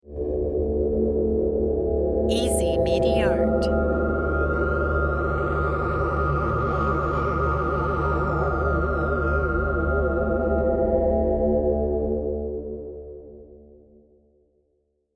mystic sound effect

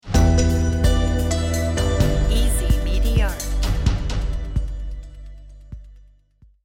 excited pulse logo

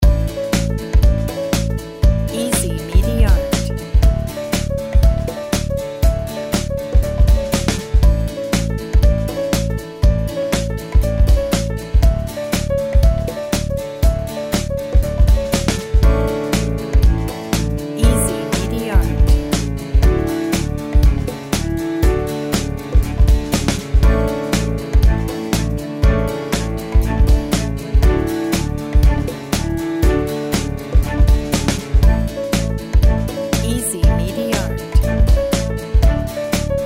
positive emotions

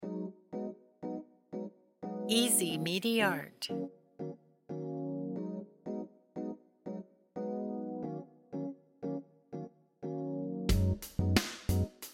chillout

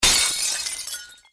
glass break

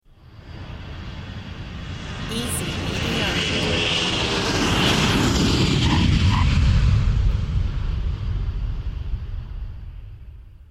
jet plane

